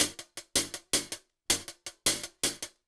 Disco; Zero-G; Monster Beats

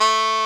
SAX XSAX, Sax Sect.; Saxophones

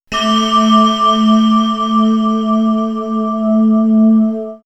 Swirls Bell, pads; X-Static Goldmine

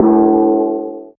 Fairlight IIx CMI Tymp, Drum Machines

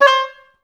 OBS C4, Advanced Orchestra; Best Service

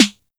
Snare OS; Sonic Foundry; Rondo Brothers Trip-Hop Loops & Samples; El Corazon

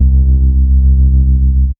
C Bass; Electronisounds; Vibration Expedition One Shots

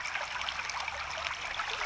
Waterway F Dark

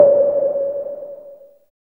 JUNO NOISE, JUNO PERC; Drums In Your FACE!

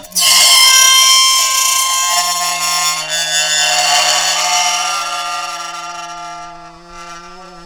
ronk, science fiction

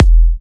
E kicks; markus hakala kicks

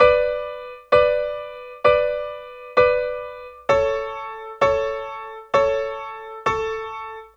KEYB, Hip-Hop & R&B; USB Soundscan vol.47; AKAI

